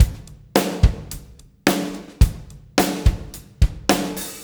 PULL, Backbeat, PULL UP; Spectrasonics

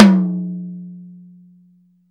TOM, Drum Dry; East Collexion; Drum 1

